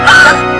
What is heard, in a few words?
uhhh